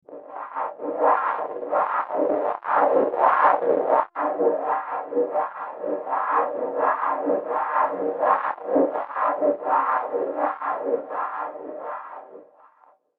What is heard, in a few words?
Grainy Tunnel Machine, machinery sound effect; horror sound effect